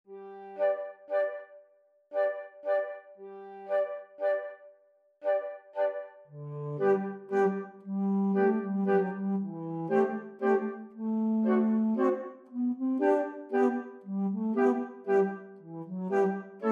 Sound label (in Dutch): fluit; L-Elephant; Saint-Saens; Carnaval des Animaux